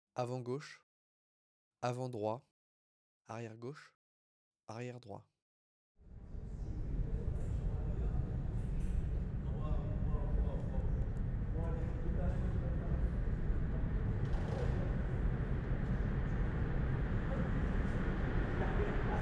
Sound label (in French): AMBIANCE 4.0 MARSEILLE METRO PRADO QUAI ARRIVEE DEPART METRO PASSAGES VOIX; Quadro